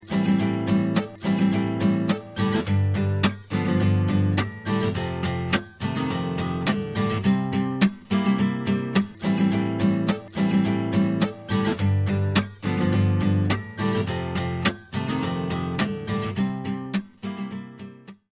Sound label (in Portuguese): violão; instrumentos